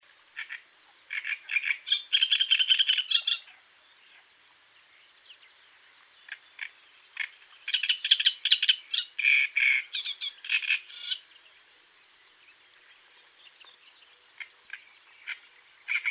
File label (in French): Rousserolle turdoide, chants oiseaux